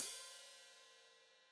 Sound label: Hat Foot Open, Hi-Hat